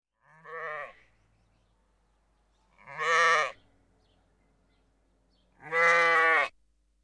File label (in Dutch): Schaap - Ooi; KMP Film Video Sound Effects